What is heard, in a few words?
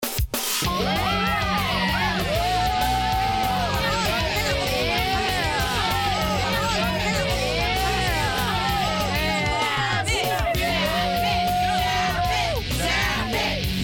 Zapper